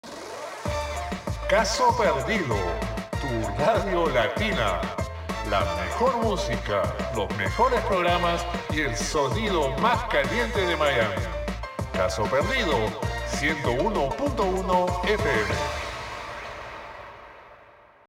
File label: Radio Latino Jingle; Gangstar Miami Vindication; Android; macOS